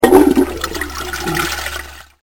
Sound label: flush